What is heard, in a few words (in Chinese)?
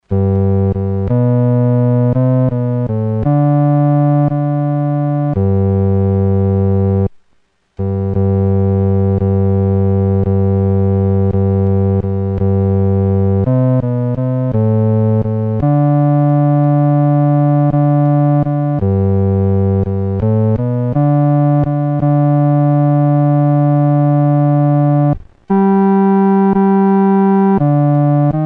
独奏（第四声）